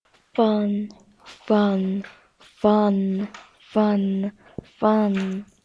phone

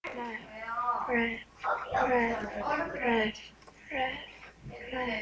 laugh

